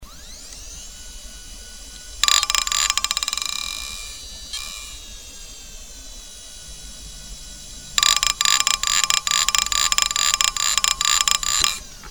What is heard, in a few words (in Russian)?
Stuk vinchestera QUANTUM CX vyzvannyy neispravnostyu bloka magnitnyh golovok